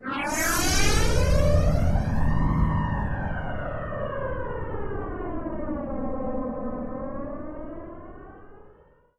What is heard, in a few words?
VFX Atmos